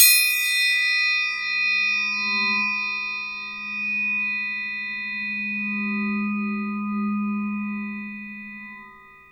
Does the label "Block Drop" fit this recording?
No